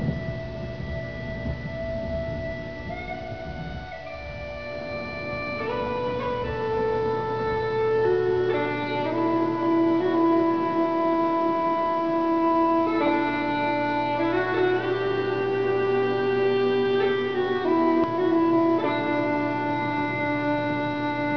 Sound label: bagpipes